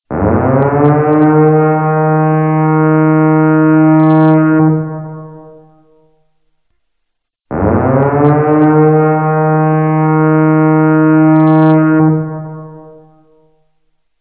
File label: sirene; yae; dirijabl